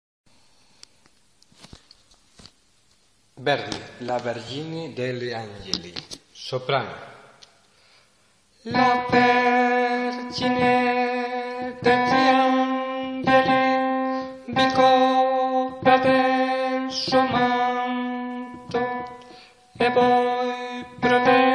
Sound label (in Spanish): la virgen sopranos; Valladolid; La forza del Destino (La Vergine degli Angeli); Orfeón de Valladolid